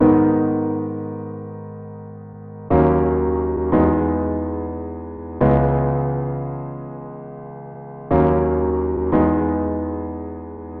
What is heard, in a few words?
warm piano processed; kern audio